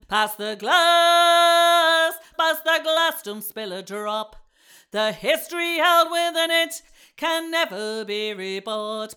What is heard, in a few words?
Resonance Control Glass Auto EQ, Mixing Lead Vocals